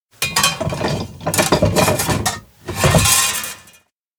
plane build work; anemoi

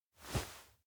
hand on chest; awayuki; anemoi パッケージ版 1.1

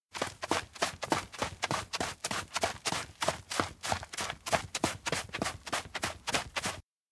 steps outdoor beach run; anemoi

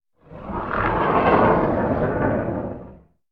monster damage; anemoi

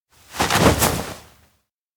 release hands; spica; anemoi

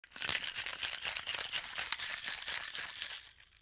Shake; anemoi

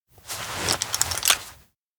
belt buckle; anemoi

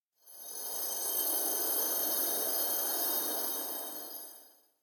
fairy ring glow; anemoi

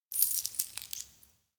pendant pray grip; anemoi

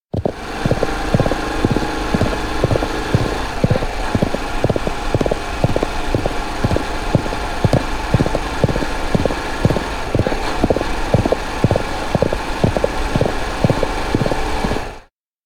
bike horse parallel; anemoi

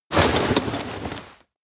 backpack rummage; anemoi

